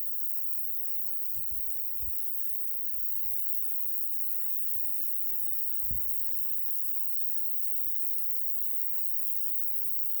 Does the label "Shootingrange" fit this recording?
No